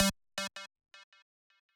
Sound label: lead